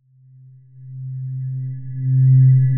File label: Riser C; Uplift; Ghosthack